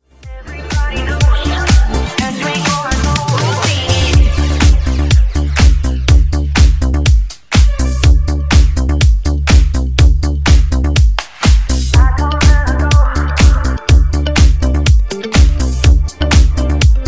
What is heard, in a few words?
Funky 123Bpm